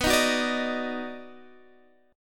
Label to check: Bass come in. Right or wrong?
Wrong